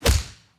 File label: Mage Attack hit